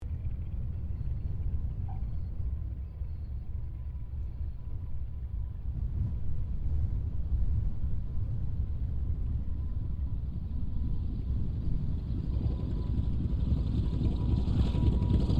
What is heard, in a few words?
AIRCRAFT T-28D TROJAN LAND; Airplanes (Sound Effects:Vehicles)